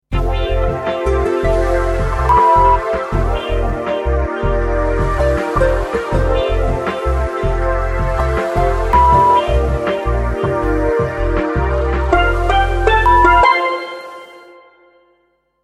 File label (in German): Sound Logo mit Sound Bett 5 Toene Dream